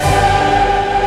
ORCHESTR HITZ

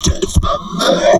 TALK 160, Drum Loops Crazy Processed; USB Soundscan vol.07; AKAI

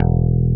E1 bass

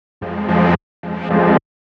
110 Fm Chords, Melodic Loops; M8; Electronisounds

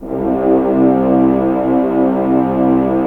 Horns; Roland - LA Composer vol. 4; HZ Horns

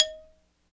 XYLO, Afro Xylophn

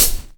HH OPEN

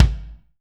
BD, DR BD; Best Service Gigapack 2

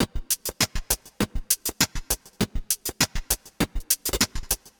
Side chain gated Topline (100BPM); creative gate; Loops; musicradar

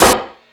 Sluggo Drums, Drum Machines

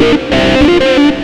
xtra lead; Sonic Foundry (Sony Creative Software); Ugly Remnants VOL-2

